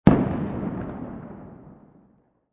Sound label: explosion sound